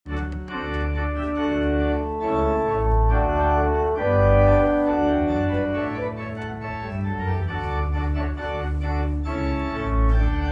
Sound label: Prelude